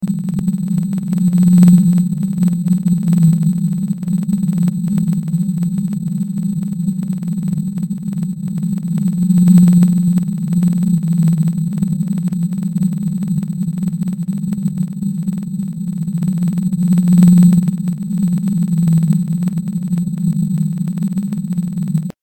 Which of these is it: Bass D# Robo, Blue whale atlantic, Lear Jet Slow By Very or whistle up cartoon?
Blue whale atlantic